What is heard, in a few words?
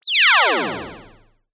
science fiction retro laser beam